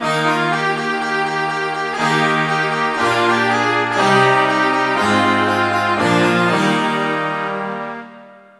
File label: India, Fanfare; Worms Armageddon